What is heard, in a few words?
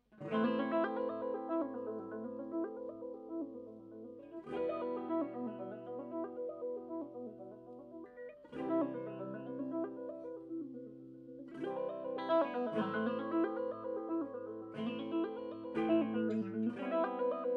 pyramids step